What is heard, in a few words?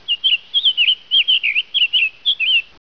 night bird